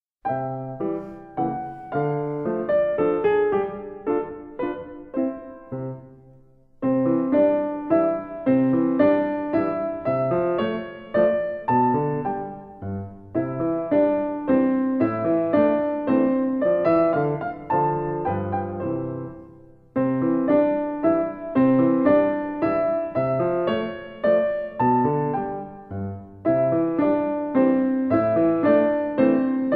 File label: Waltz Beat